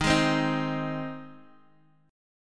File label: Ab Major Eb